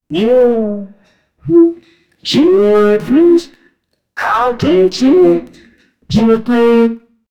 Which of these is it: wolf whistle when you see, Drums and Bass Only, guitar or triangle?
wolf whistle when you see